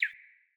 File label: Cardinal Male Bird Single Chirp